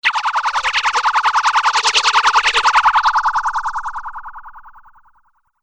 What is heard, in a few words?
Laser Pulsating Scanner, sci-fi laser sound effect; Laser Future